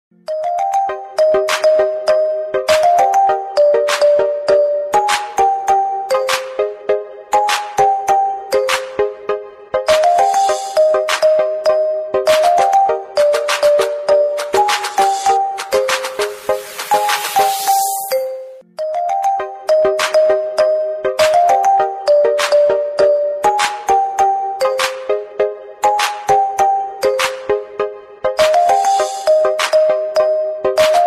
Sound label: Instrumental